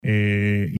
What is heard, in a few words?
eeeee